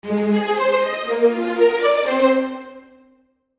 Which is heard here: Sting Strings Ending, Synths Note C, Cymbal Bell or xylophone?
Sting Strings Ending